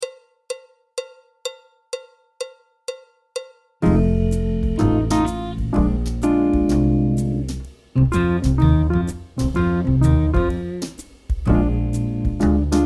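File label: backing track, Jazz; clarinet, flute; Jazz Syllabus Level 1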